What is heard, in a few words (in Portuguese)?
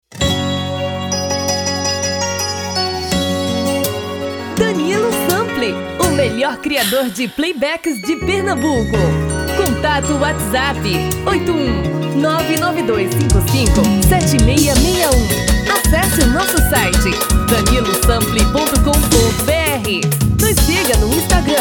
ELETRICO